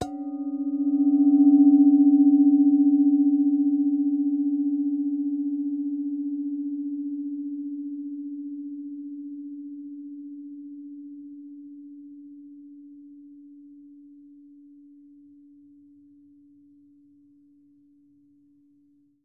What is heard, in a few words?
Pot Gong, strike, medium, destructive, long fade, C#, inside; Eiravaein Works; 48Kilos; flex